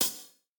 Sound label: breakbeat